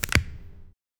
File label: short wall mounted object; object manipulation